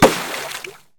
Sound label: Bullet hit water; Hovl Studio; SFX Battle sounds; WhiteMan Unity2D